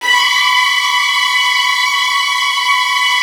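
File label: violin trills; Virtuoso Strings; ILIO; Kirk Hunter Virtuoso Strings VOL-1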